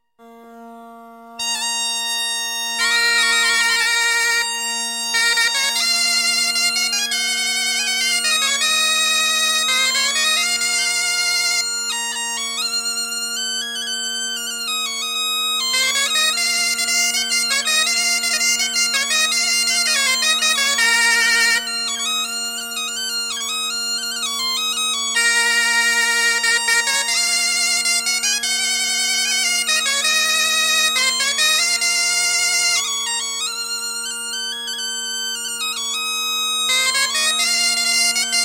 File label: Plinn Bal; Bro Dreger